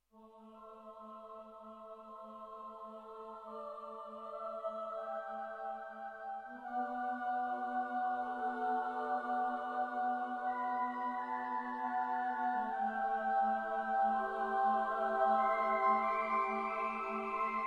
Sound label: Choir